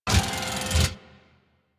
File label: Mech Servo Fast; Nechro